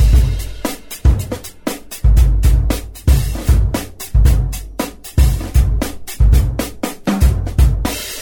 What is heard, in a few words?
funky beat, drum loops